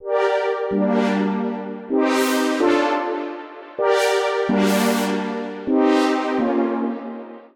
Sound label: Chords G Minor 127 bpm; Ruffin; Ableton; Factory Packs; Skitter and Step